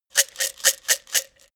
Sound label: pillbox shake